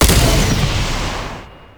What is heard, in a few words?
Rifle Alt Fire Shot; Weapons; Warframe; Corpus; Ruban